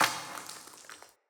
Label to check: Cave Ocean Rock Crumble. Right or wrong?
Right